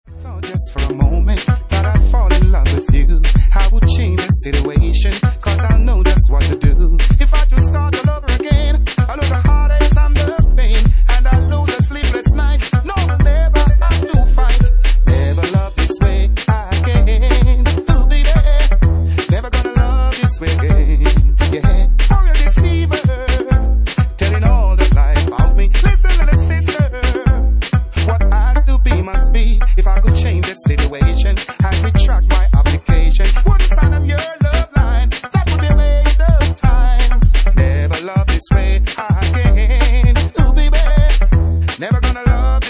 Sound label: Uk Funky Mixes